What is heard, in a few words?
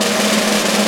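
Snare Roll; Digital Sound Factory Virtuoso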